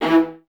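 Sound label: Violas E 3 Staccato; Miroslav Vitous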